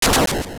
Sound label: Dungeon Door; A dungeon door opening (similar to "Barrier" sound but with more "echo"); Zelda Oracle